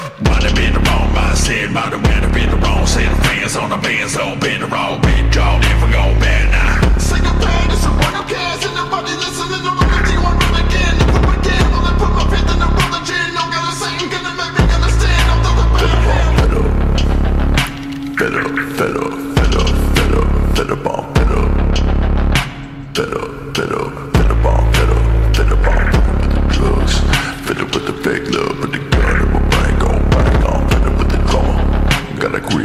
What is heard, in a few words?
SLOWED REVERB